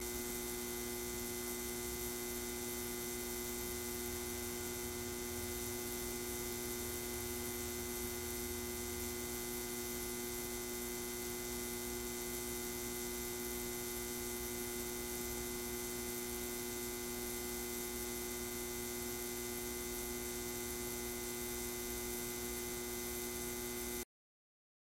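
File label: sounds of an electric garland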